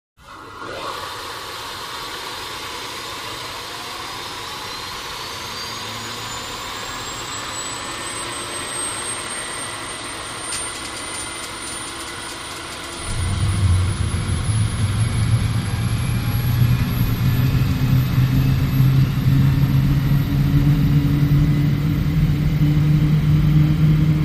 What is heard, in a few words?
Idle; Air Intakes Open, Piercing Whistle With Snaps Of Ignition Sparks, Low Intense Roar, Whistle Dies Out With Steady Idle. Close Perspective. Jet.; Gnat